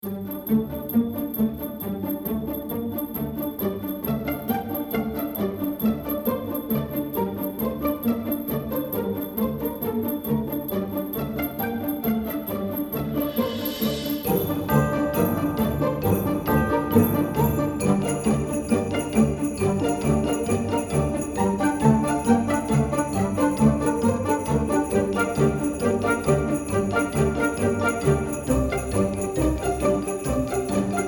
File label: sleighbells ho ho ho